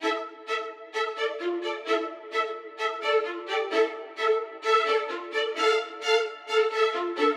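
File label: Ethnic Loop Strings 130 E minor; Ethnic Loop Vibes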